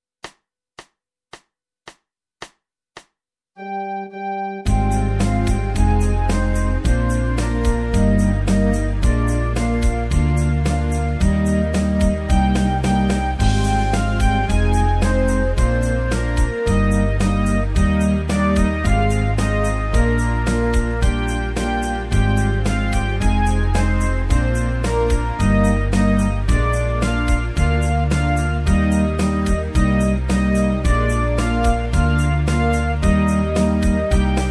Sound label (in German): Jean aus Lille Französisches Volkslied, bassgitarre; Schulband Musizierklassen